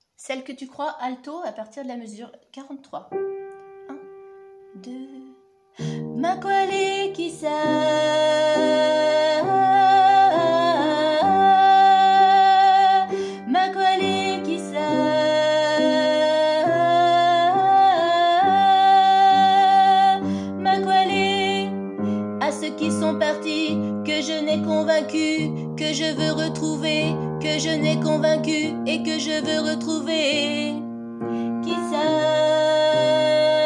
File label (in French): alto